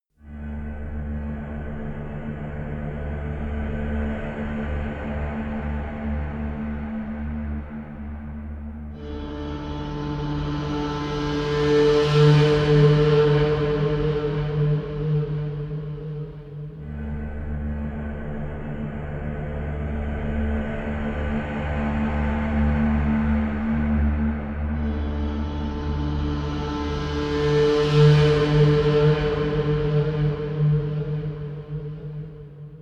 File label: whale song, music elements; flaechen